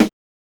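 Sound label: HSE SD, house snare drum; X-Static Goldmine 1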